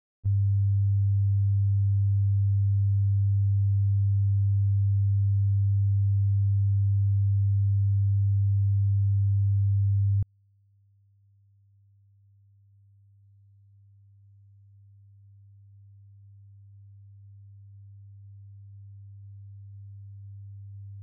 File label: 100hz, -20db, -60~0db L+r; Denon Audio Technical CD